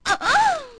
comb hit; OOT

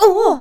Drown; Link drowning in water

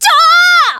Long Shout; shouting (long); Faux Hero shouting (long); zelda

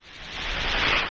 Twinrova Portal; OOT

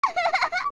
Young Laugh; Zelda

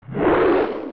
Swing; Bari; zelda; OOT

